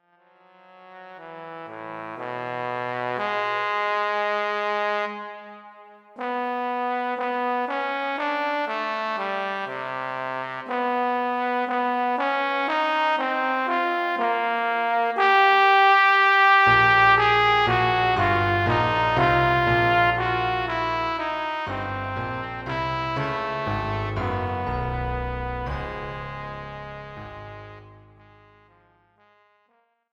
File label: Sonata for bass trombone and piano